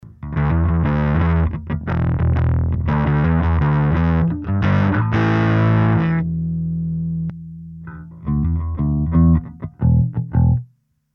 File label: fuzz dark